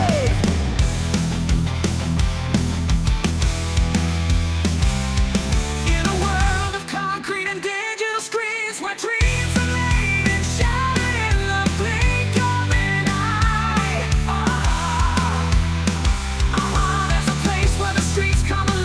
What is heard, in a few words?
rock bass guitar